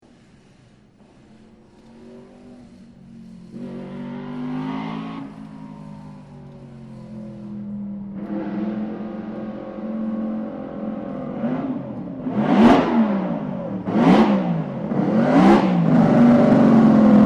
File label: BRM return to pits